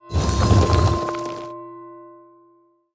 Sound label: Gurubashi Offering Attack